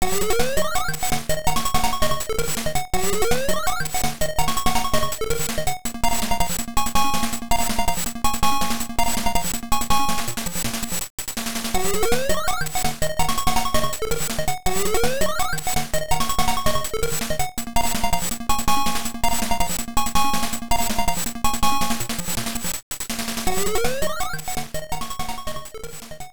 Surface BGM; Magmax; Arcade

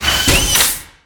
Pc Game Exit Popup; Angry Birds Star Wars 2

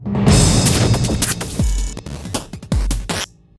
Fight Combo Fail; Remember Me

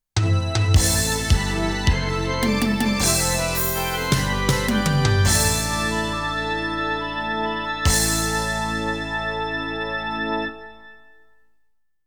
Final Stage Clear BGM

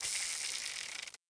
Russian Roulette Spin; Learn to Fly 3

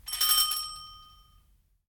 Door Bell; The 39 Steps

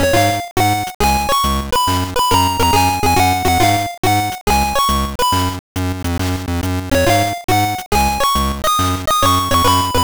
Level Music; Bob the Builder Fix It Fun; GBC

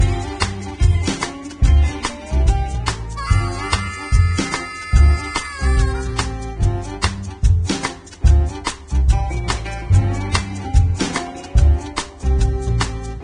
Train Music; Diner Dash: Flo on the Go; NDS

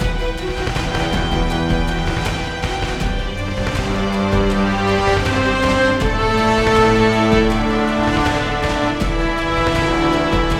Mood High

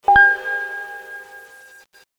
Medal Sound; Learn to Fly 3

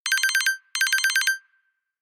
Phone Ring

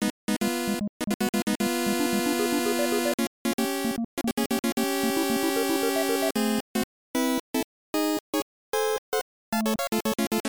Mission Failed, Game Over; Star Luster; x68000

